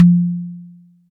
big tome drum sample f key, Royality free tom sound tuned to the F note. Loudest frequency: 196Hz; tom drum samples